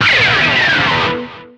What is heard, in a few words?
Pickup Guitar Scrape; Pickups